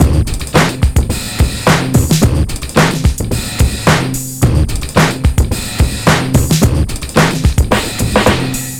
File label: DRM, Breakbeat; HITBREAK 109